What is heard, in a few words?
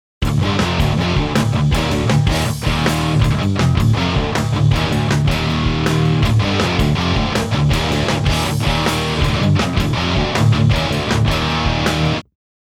Crunch